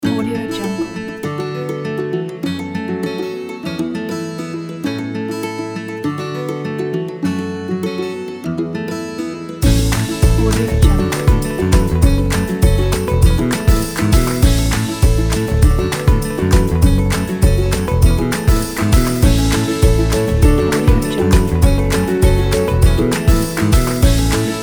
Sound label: folk